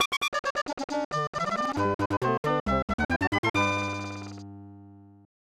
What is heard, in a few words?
Bonus Win; Mario and Wario